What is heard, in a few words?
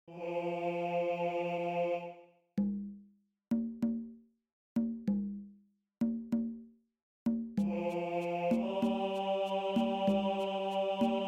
BASS, choir